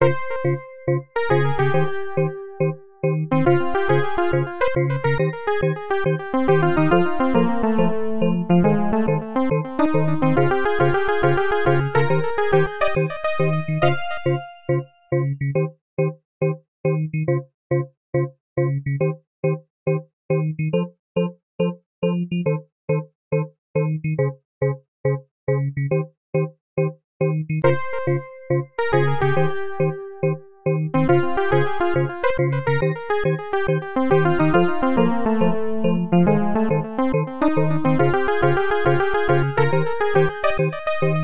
BGM minigame Ranking

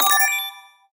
hud leader get crown; Just Dance 2018

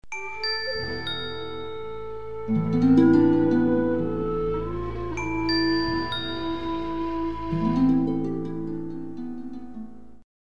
sheep; Gothic 2; Liesel

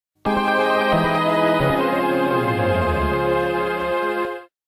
Logo (Arcade Version 3)

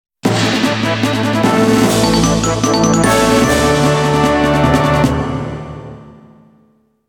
Reward Fanfare

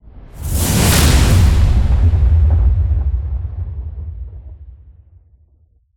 Transition; Everspace 2

